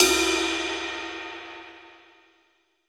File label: Folk Ride, Cymbals; Roland BK-5